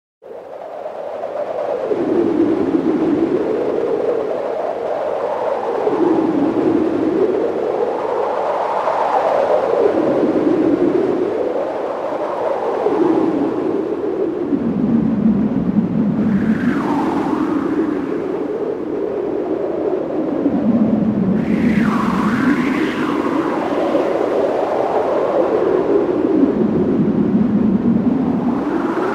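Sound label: atmosphere; Universal Sound Bank; Soundscan 14 Twisted Special FX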